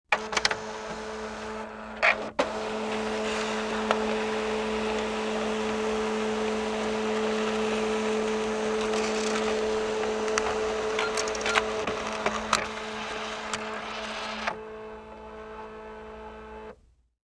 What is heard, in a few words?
fax incoming, machine sound effects